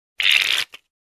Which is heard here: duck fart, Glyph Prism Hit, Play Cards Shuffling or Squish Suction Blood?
Play Cards Shuffling